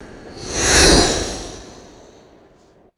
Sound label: quick airy sucking whoosh sting